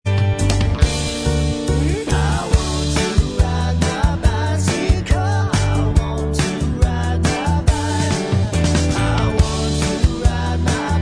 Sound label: Bvox Track